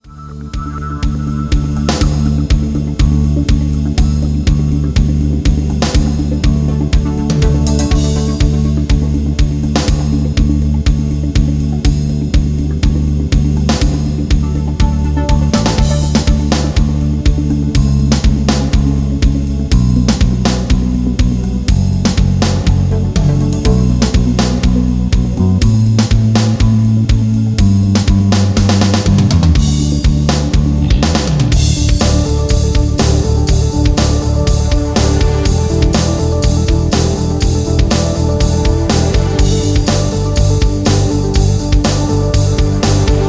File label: rhythm